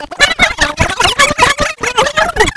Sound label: rewind